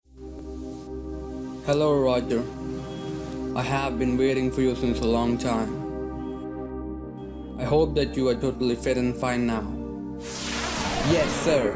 Dialogue

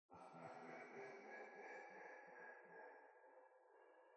laugh; The Joy of Creation Halloween; Verblaugh 5

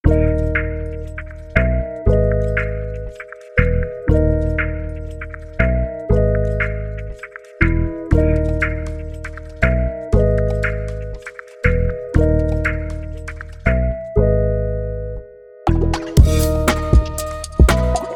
Fears (119 Bpm)

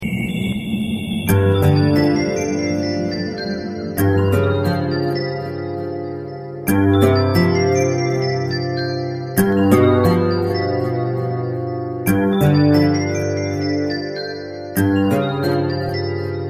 bg cave

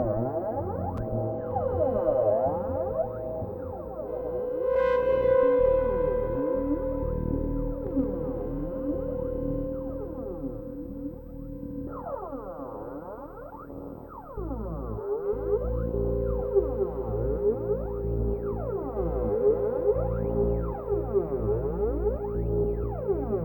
Unstable Boss Drone; rive wreck hack die retry; ps4

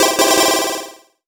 Solo Chip C64 Positive; Startide; macos switch windows gamerip 2017

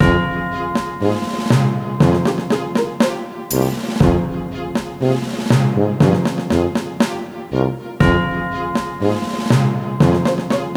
Music Pirate; Summer Sports Paradise Island; Wii

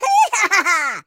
fly; Tom

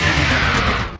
Wings Closing; blastmorph pinball; xbox series xs

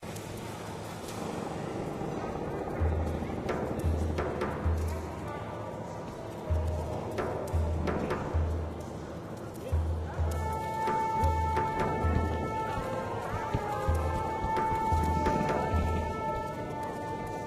Instrumental Ambience; Underland; Arena Blood on the Sand VR

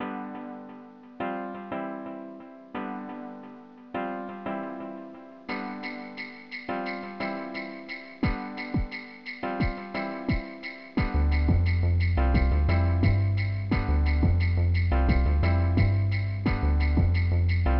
Holiday Music - Rock; Holiday Music - GameHouse Rock; Tap a Jam; Windows gamerip 2003

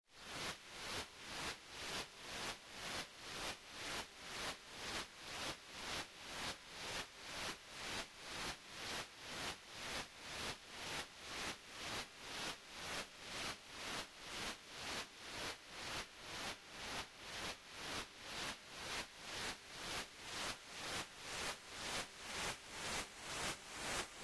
NOISE

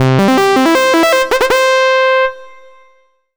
Solo Clean Sawtooth Positive; startide

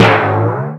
bounce, hot potato

